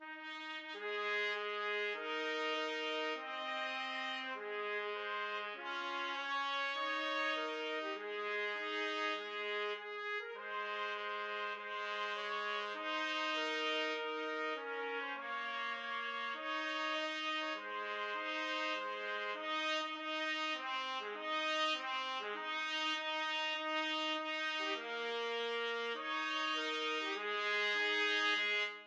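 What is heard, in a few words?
la marseillaise trumpet; trumpet duet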